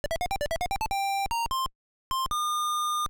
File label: GAME START; mat mania challenge atari 7800 gamerip 1990